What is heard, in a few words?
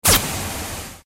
laser rifle, Guns Fire